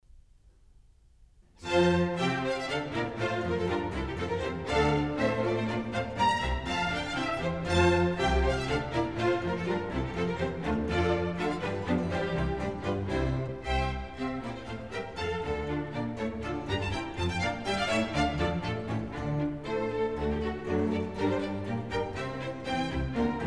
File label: Water Music Suite